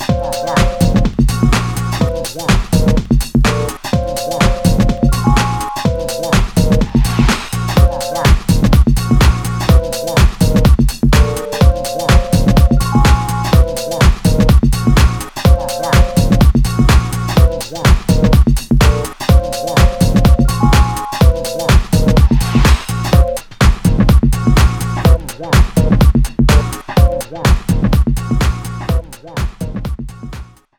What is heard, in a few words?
Quick Beat